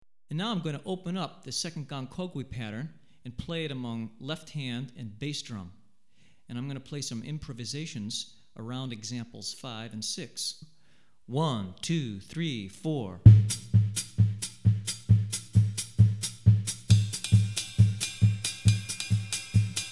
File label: Gahu, Drumset Examples, West African Rhythms For Drumset; Hartigan